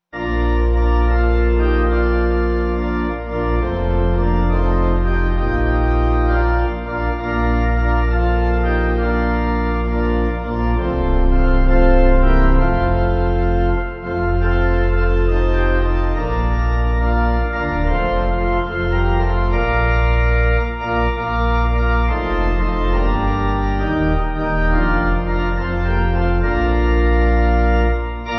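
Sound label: Dont Forget The Sabbath Pipe, Pipe Organ; Bradbury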